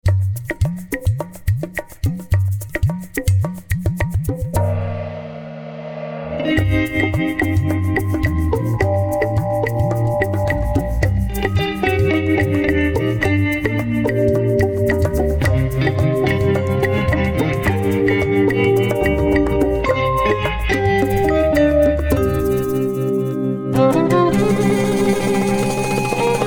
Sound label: Trailer; SF Indie Fest